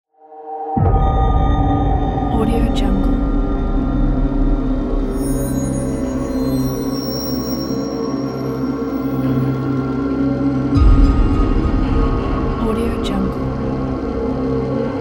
suspense dark atmosphere

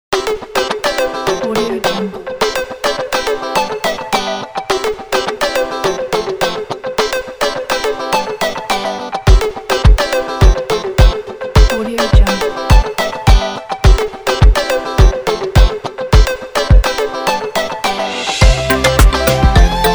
uplifting funny pop

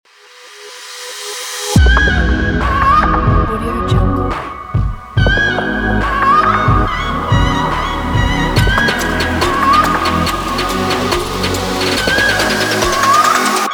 the future pop

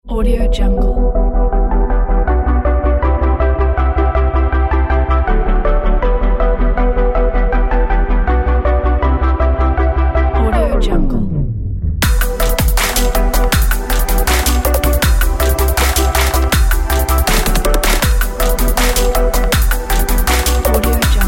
dub step sport

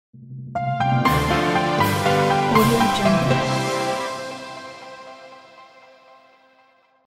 waltz logo; archimusic

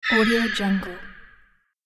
space bird call whoosh

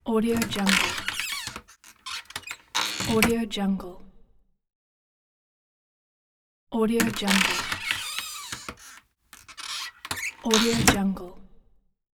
squeaky cabinet door

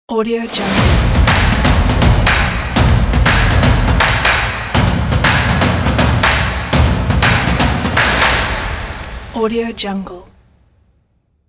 sport logo

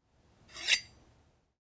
blade scrape

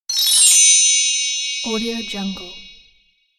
sparkling chime

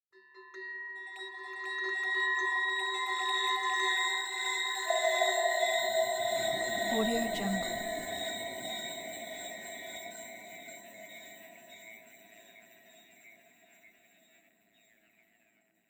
yoga zen art sound

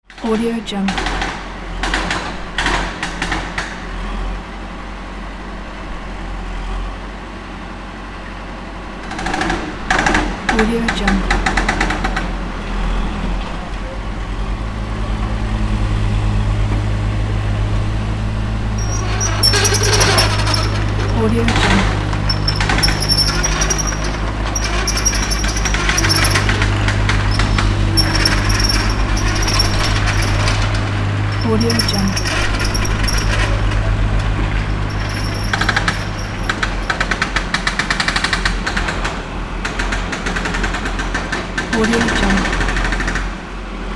crowded construction area; okanakdeniz

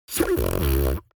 human mouth beatbox tongue roll hard; smartsoundfx

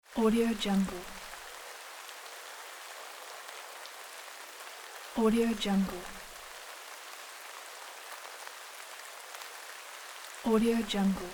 light forest rain

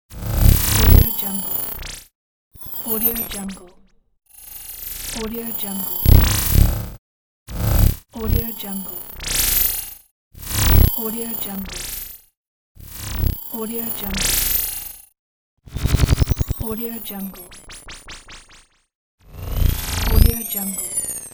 futuristic high tech motion; kaelv

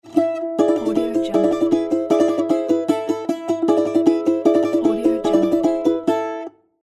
russian balalaika logo

